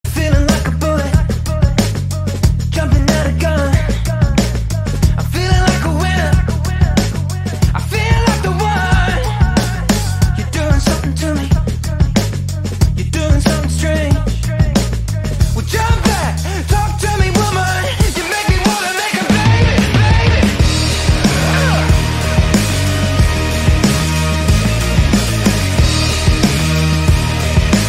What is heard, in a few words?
ringtone; BLOW